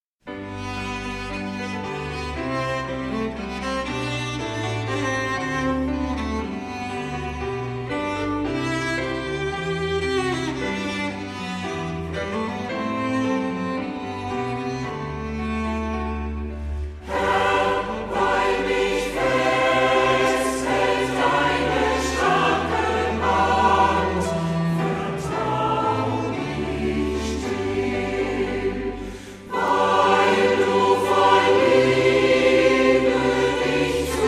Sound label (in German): Chor